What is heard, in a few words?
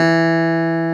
CLAV E, CLAV PIANET; Sonic Reality; Vintage Timetraveler